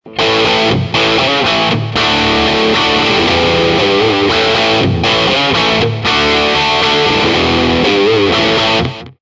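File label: platinum 90 bridge dirty, guitar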